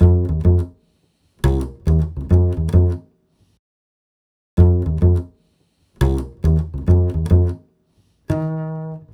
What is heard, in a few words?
105 NuJazz Bass F maj; Pro Tools Essential Loops; Digidesign